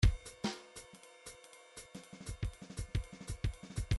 midi grooves bebop; Bdf Midi File Grooves

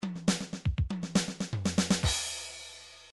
midi drums drums fill

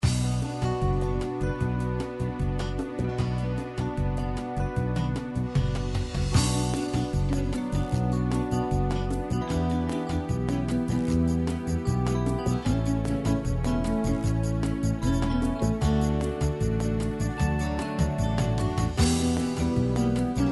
bulgarian duet

